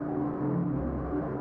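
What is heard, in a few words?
Morgue door loop; Phasmophobia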